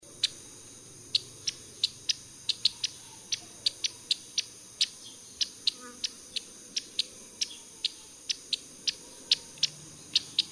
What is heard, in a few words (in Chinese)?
白腰文鳥; 尖尾文鳥; 梅花雀科/留鳥